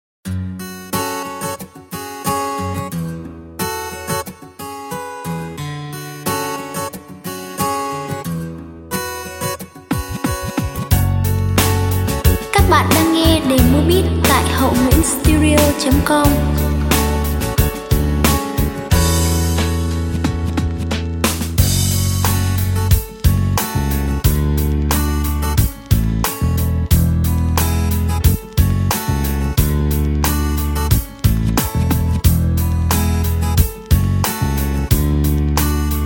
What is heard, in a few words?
beat, playback